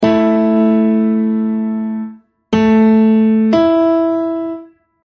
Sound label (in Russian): kvinta